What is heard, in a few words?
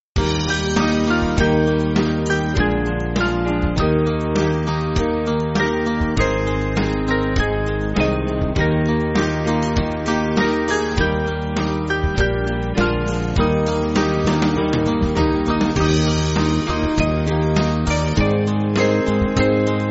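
Music Backing Files for Churches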